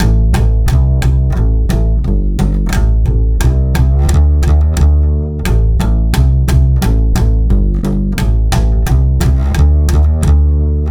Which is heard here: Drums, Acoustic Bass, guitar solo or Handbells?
Acoustic Bass